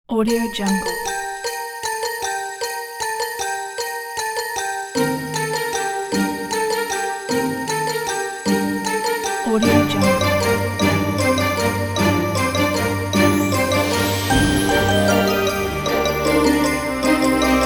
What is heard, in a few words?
carol of the bells orchestral version